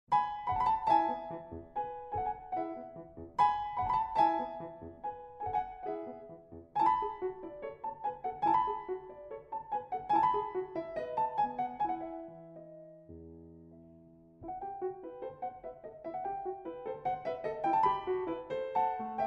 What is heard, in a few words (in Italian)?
sonata in mi maggiore; d scarlatti; k20